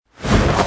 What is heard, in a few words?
jump